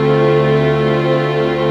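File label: Pad D minor 7, Orchestral; Sonic Foundry (Sony Creative Software); Orchestral Series VOL-4 - Rock and Pop; Pads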